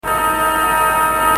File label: Siren Short